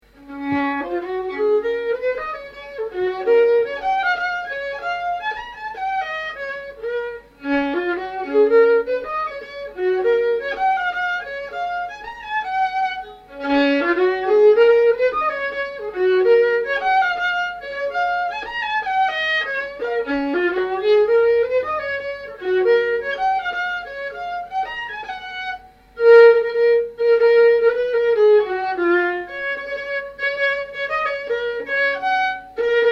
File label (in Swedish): Polska